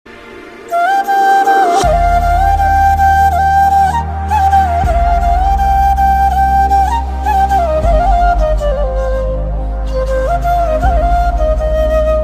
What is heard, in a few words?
flute, flute ringtone, bansuri; mahadev; mahakal